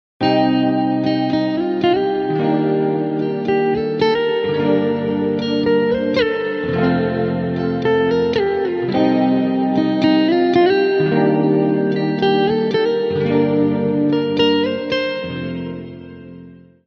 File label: Play guitar